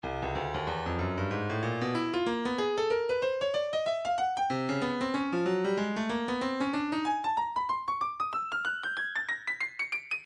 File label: stylus breakbeat midi 117 bpm proghouse